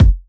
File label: Kick, Kickdrum; Equipped Music; Loungin House